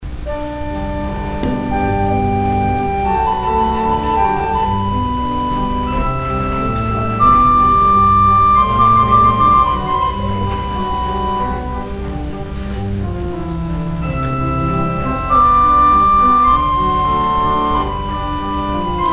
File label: organ interlude